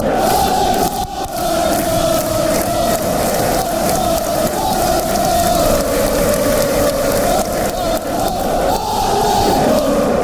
hartlepool

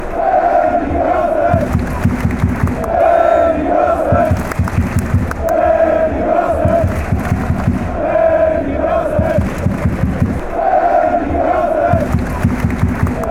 chant, fan chants; dresden

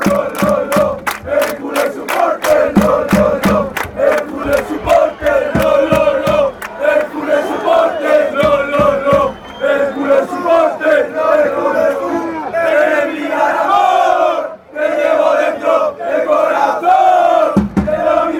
suporter fan chants; hercules

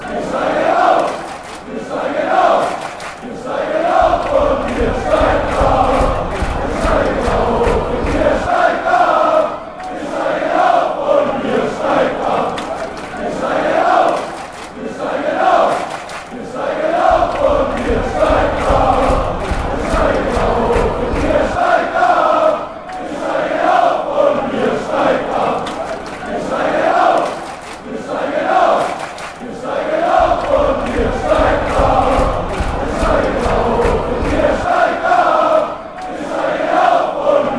we will be promoted, fan chants

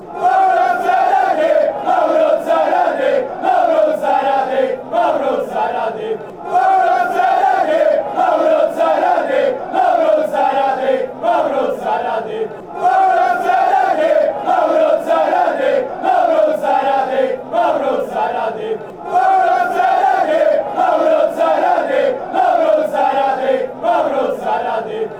lazio chant

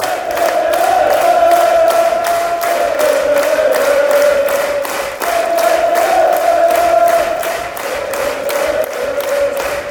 ring fire, chants; scunny

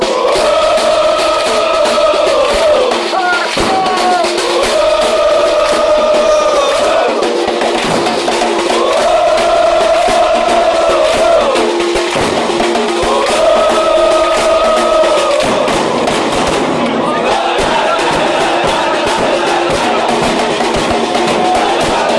dale, chants; cavese

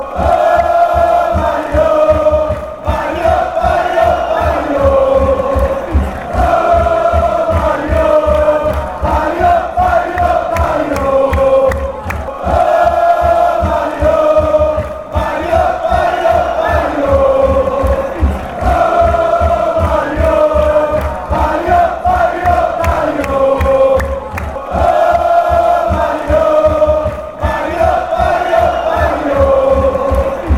bari oh, chants

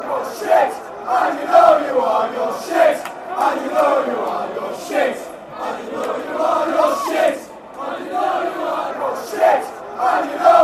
youre sit fanchants; cufc

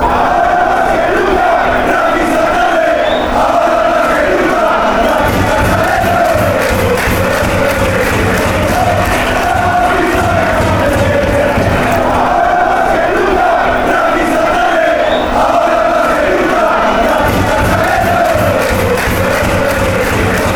now more ever, chants

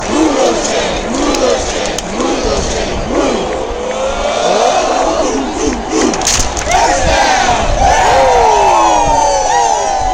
move those chains, fan chants

